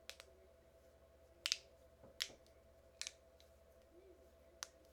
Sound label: Knuckles cracked